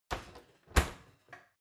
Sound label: oven close baking sheet ringing soft